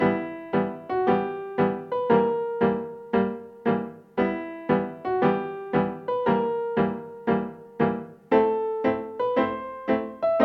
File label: spooky house